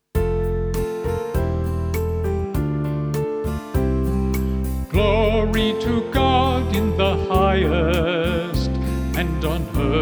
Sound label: Gloria with vocal